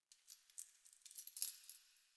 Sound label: Coin Drop; Ambience; Warframe; Nineteen Ninety Nine